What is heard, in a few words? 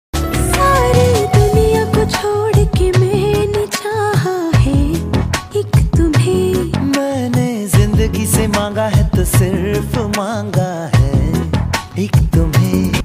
new female version love sad song whatsapp status hindi ringtone new female status